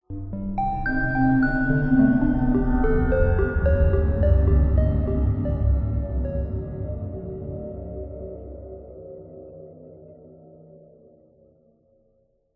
horror ghostly ominous haunted musical riff chime scary